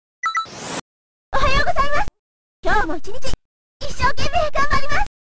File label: Startup; TwinBee Yahho! - Fushigi no Kuni de Ōabare!!